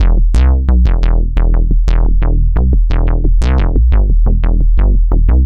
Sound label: Synth Bass